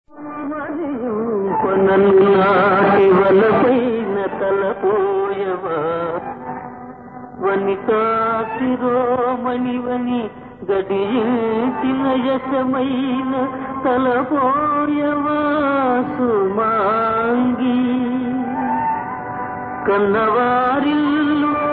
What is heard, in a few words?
Padyam; NalaDamayanti